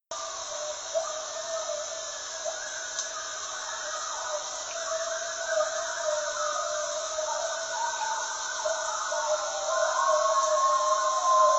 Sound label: coyotes